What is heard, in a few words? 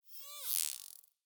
dolphin water idle; Minecraft